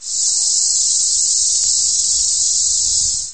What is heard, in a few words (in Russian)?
cikada shumnii massovii zvonkii